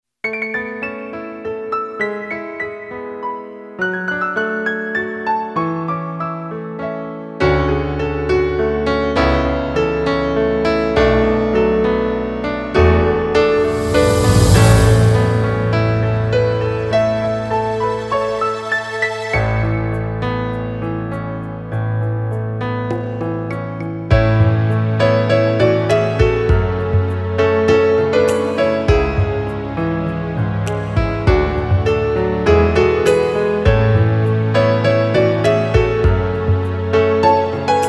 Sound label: Grand Piano, Yamaha PSR-550; Factory demos